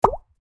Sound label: Bar chart pop in animation infographics